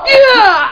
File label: NYUUAH, PEOPLE; Klik & Play; MACLIFE